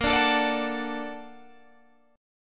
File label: Bb Augmented Major 7th Bb, Guitar Standard; Bb+M7 strummed; JGuitar